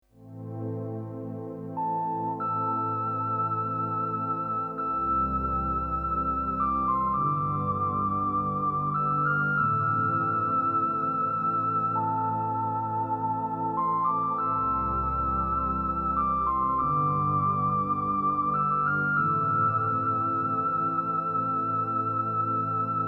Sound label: flutey; antilles